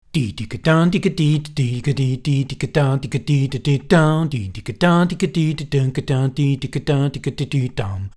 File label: TF11 Voc